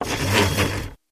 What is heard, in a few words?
Typewriter Elect Pu; typewriters